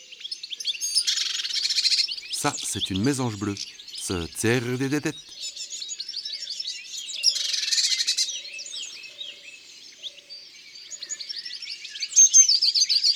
Mesange bleue, chants d'oiseaux; ABC des chants d'oiseaux

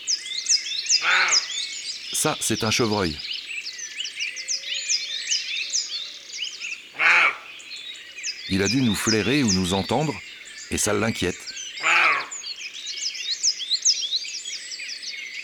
Chevreuil; abc de la nature

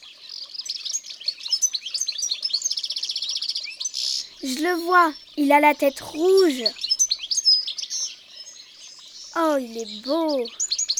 le chardonneret elegant; cuicui et compagnie

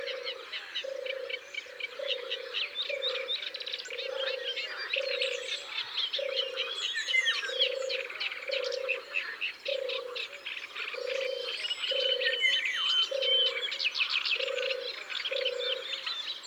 Tourterelle des bois; abc de la nature

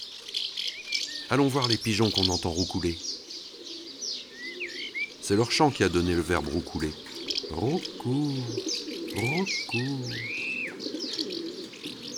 Pigeon domestique biset; ABC des chants d oiseaux

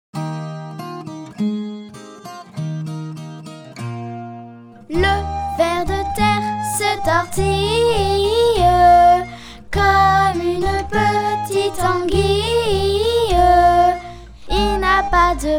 Le ver de terre SONG

